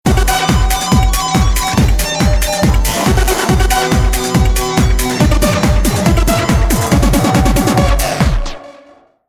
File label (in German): Klingelton Trance